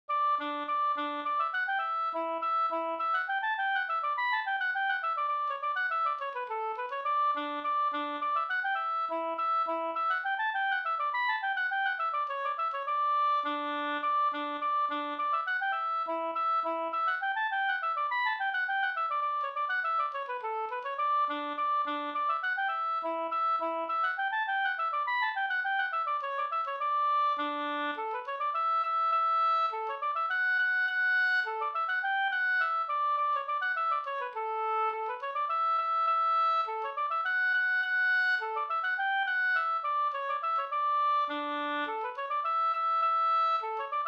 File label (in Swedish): slängpolska